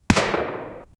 antique musket gunshot

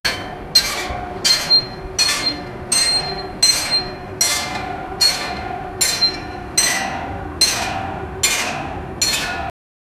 sounds of iron constructi, construction

